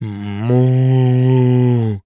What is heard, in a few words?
Cartoon Human Male Cow Depressed Cow; AdobeCC